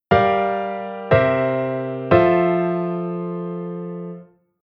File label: Chord